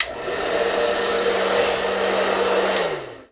Blow Dryer, Bathroom; 1001 Sound Effects

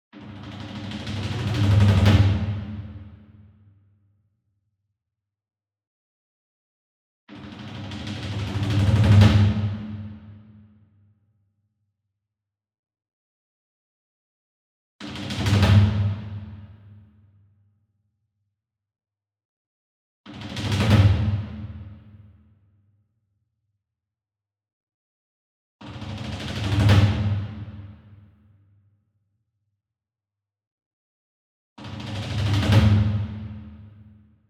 Single Drum Kodo Roll Hard Beater Far; boom library; Cinematic Strikes Construction Kit

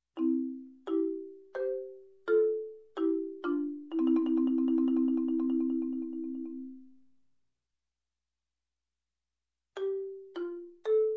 Marimba tag, Music, Percussion; Sound Ideas 2000 Series

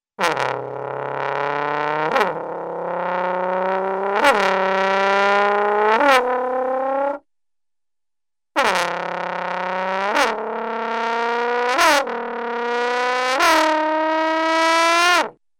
Low Pitch Muted Trombone; Cartoon; Hollywood Edge; Cartoon Trax

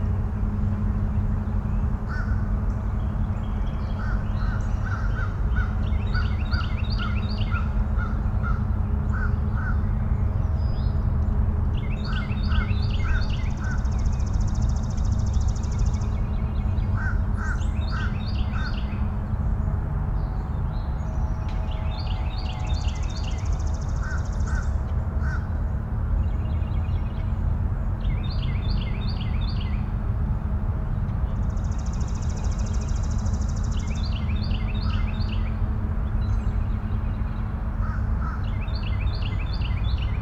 Suburban park day birds machinery; urban and suburban; boom library

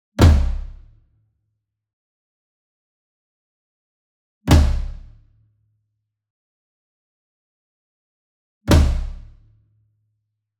SINGLE DRUM Tom Concert Flam Hard Beater Near; boom library; Cinematic Strikes Construction Kit

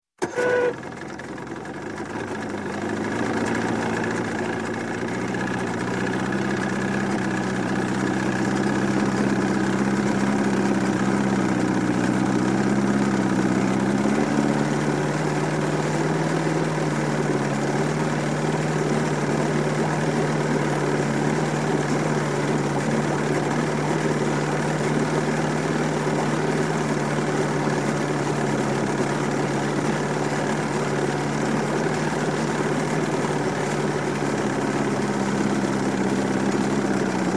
Boat Outboard, 115 Hp - Start, Idle, Stop, Mari; Marine; Sound Ideas; Series 2000

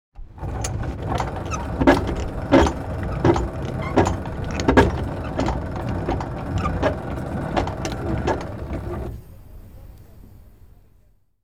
Boat Lifting Anchor; KMP Film Video Sound Effects

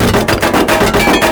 Bullet Hit Metal Loop; Destruction

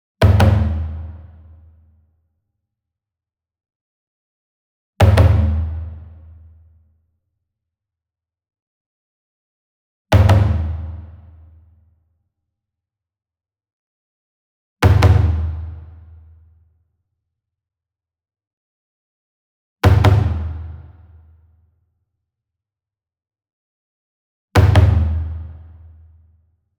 SINGLE DRUM Kodo Double Hard Beater Medium; boom library; Cinematic Strikes Construction Kit

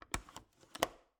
Cabin, window, latch, flip, lock, open; Eiravaein Works; Vaeyan IV

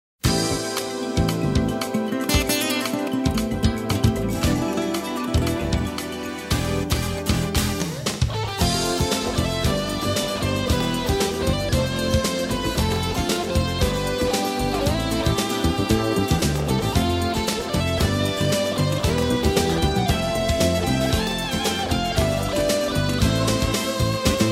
rock

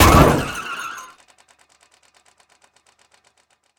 hit motorbike; Blind Drive

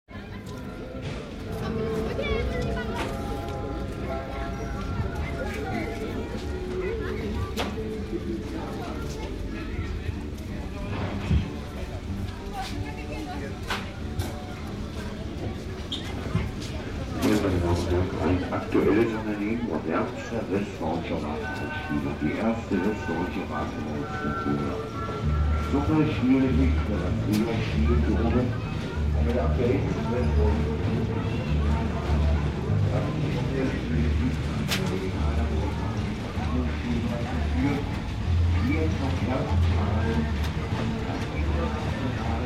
Fair, Amusement Park Midway Ambience Rides, p.a.; Sound Ideas; Series 2000

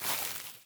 grass step, footsteps

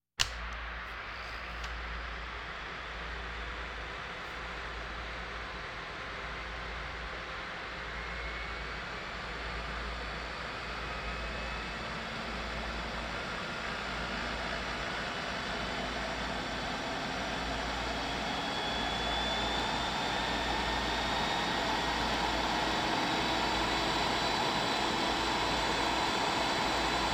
Hospital effects

